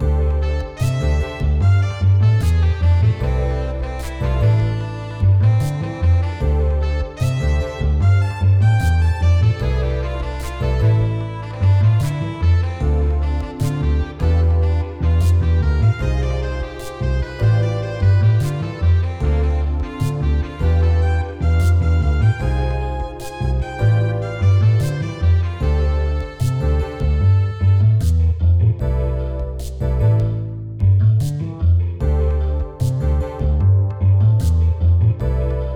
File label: Line Conversation Loop; Get in the Car, Loser